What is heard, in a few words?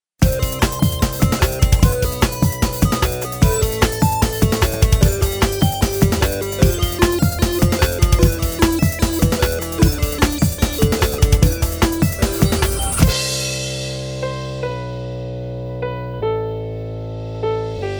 bed anti depressant